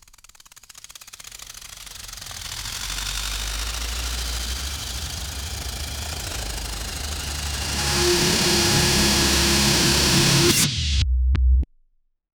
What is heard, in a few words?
fast frame cuts riser; cinematic